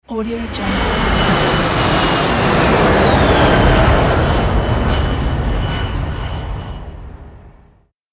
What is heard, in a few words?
plane fly by